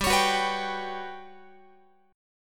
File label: G Major Sharp 11th G down Guitar 4A 4E 5A 5D 5G sharp 6C sharp; Listen to GM#11 strummed; GM#11 Chord